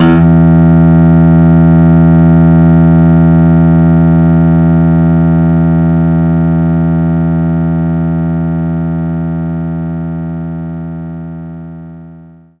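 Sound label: acoustic guitar E3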